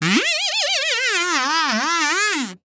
kids game notification warble crazy kazoo trippy warp; morph wrong